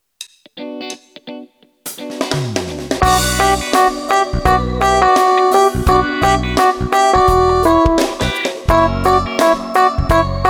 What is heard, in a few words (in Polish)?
Jesienna piosenka melodia